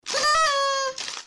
party blowers sfx

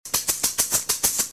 maracas

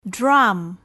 drum

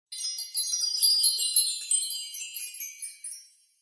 fairy Dust Magic Sound Effect reverse

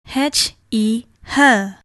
hehe

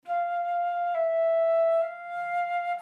flute

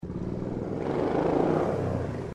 motorcycle

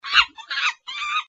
monkeys Monkeying Around

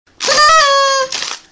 party Blowers Sound Effect

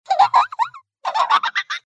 laugh delighted giggle child female

